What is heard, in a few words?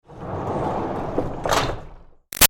Door Barn Rolling Metal Clang; Barn Doors